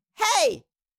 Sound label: Cartoon little child voice shout scream short